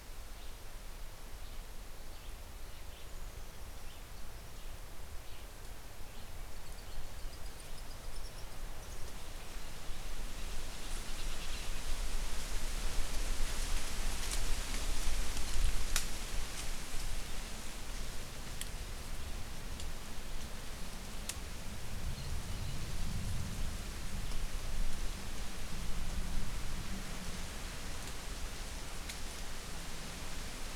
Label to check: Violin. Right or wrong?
Wrong